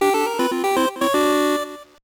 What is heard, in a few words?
Jingle; Zettai Zetsumei Dangerous Jiisan Shijou Saikyou no Dogeza; GBA